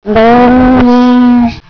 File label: alert